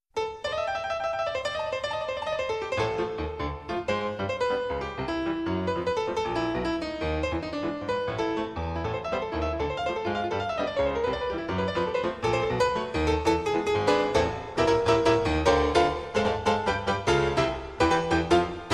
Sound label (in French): extrait piano jazz